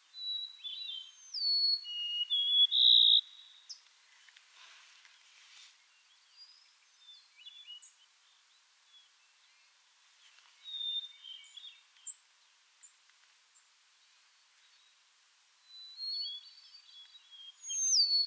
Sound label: Golden-crowned Sparrow - Zonotrichia atricapilla; birds